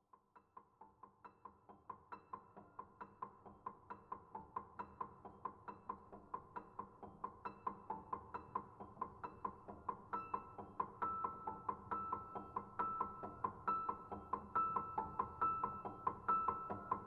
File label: Rainfall